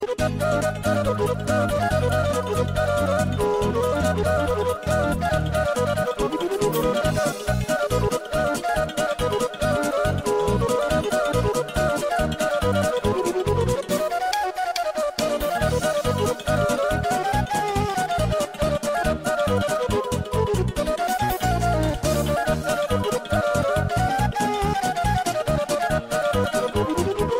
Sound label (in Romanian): hora caval